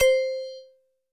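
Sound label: bell C3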